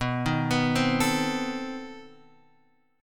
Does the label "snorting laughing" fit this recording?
No